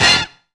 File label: BACKUP HIT; Zero-G; Funky Elements